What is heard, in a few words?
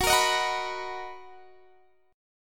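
B Major 7th G flat, chord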